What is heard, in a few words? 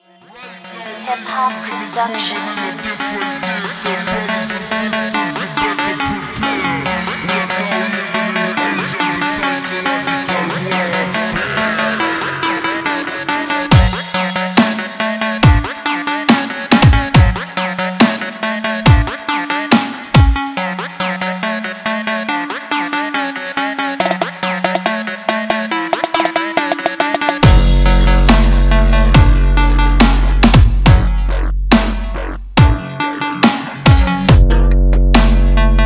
CLUB FMIN 140